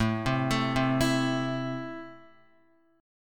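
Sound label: A Minor, Guitar, chord strum; JGuitar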